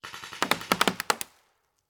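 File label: Paintball Gun Impact; Sound Ideas; Guns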